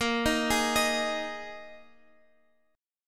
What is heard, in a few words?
Bb 7th Suspended 4th Bb, chord